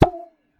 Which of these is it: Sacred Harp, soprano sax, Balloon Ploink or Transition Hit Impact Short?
Balloon Ploink